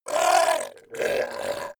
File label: fishman shout extreme pain debilitated